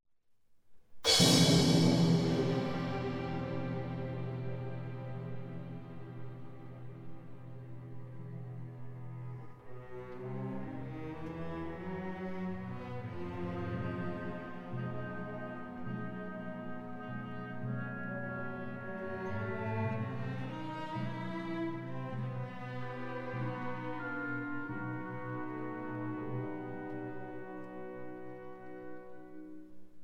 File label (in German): Impromptu op. 45 fuer Klavier und Orchester